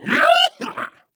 ballbot somersault; Portal 2

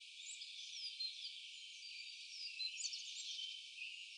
birds 1shot

